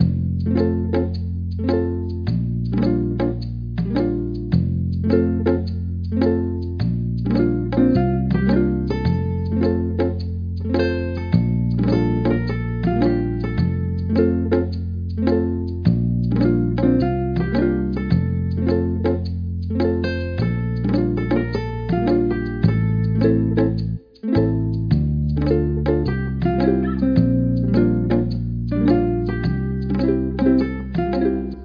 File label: home, music; pixelheros